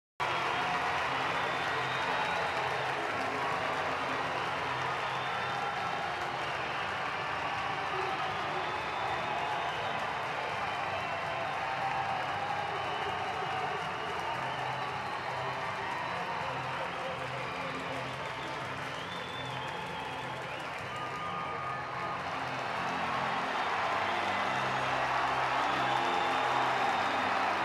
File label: base Game Sound; basketball Star Deluxe